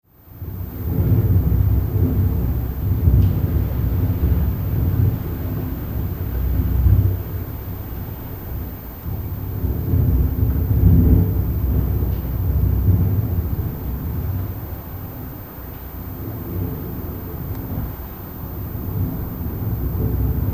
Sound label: Wind Interior Gale Force, Recorded from interior apartment; wind on windows